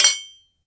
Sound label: IMPACT Metal Cling Bright; mono; IMPACTS; Universal Sound FX